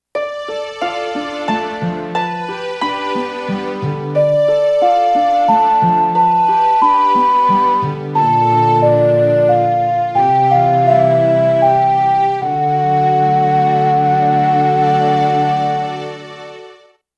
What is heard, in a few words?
Ocarina; zelda; Ocarina of Time 3D